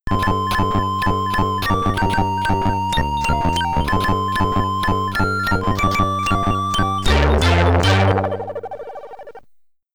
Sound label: Game Over, SID Stereo; Navy Seals; Commodore 64